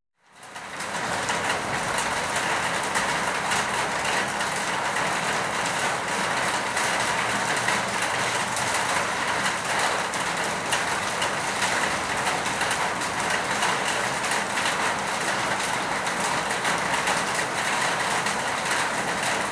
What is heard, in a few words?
Rain or Hail Against Tin Roof; The Elements